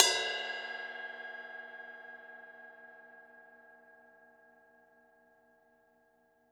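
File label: ride overhead; Q Up Arts; Denny Jaeger Private Collection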